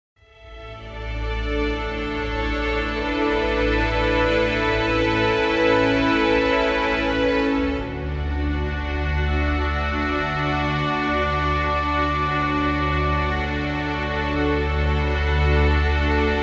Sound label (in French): PAYSAGE MAJESTUEUX